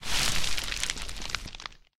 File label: bullet dirt debris; War Thunder